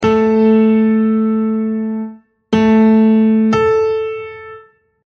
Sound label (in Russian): oktava